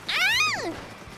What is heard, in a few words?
scream; IRyS; sussy; IRyScream